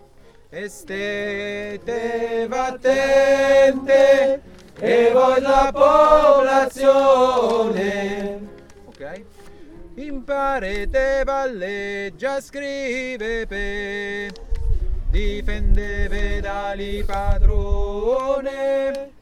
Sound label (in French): tenors refrain; Ganges